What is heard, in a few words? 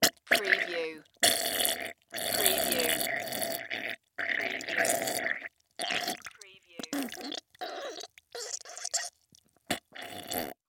Diarrhea Wet Squirt